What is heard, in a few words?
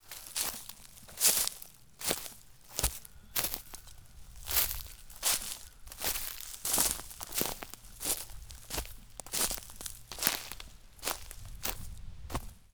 Footfalls In Leaves; footsteps